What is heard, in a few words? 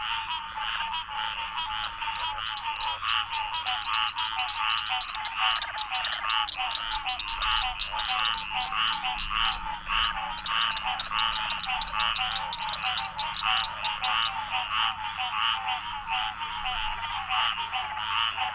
cacophany, purple martin